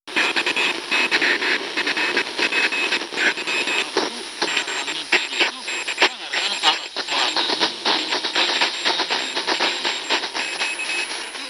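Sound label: Radio short wave Bleep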